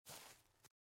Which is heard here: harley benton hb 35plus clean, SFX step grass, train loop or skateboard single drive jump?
SFX step grass